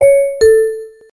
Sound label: Door Closing Chime